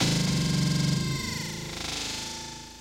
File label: Jungle Fx Sound